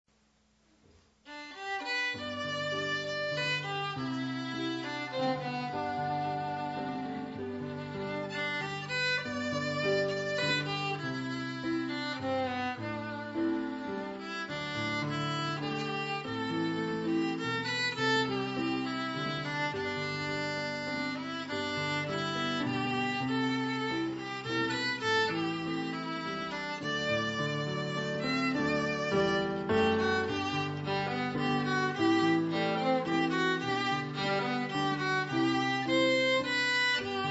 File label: Special Music lovers Waltz Violins